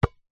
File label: basketball single bounce on court; sport